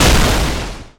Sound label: Explode; Blockman Go; Android